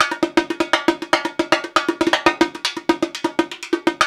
108 Rio Batucada

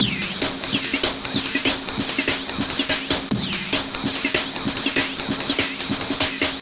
Jungle, Drums & Percussion, Electronica; Sonic Foundry (Sony Creative Software); Electronic Point Blank

